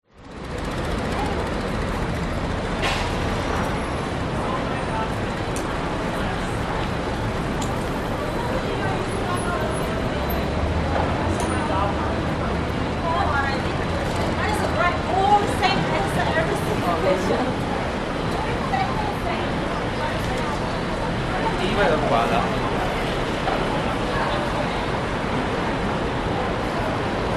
public places city amb pedestrians crossing beeps close traffic pass; north cbd sydney